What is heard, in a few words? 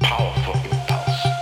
Powerful Impulse; Weird